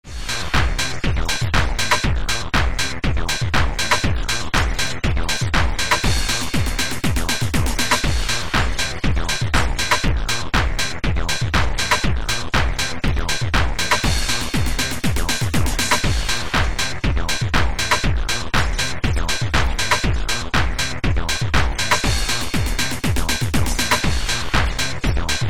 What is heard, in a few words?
RAP